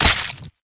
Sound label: bite medium, creature